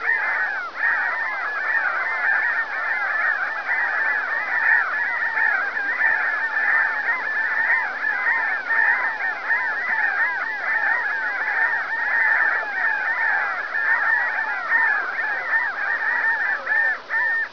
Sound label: seagulls; de pirates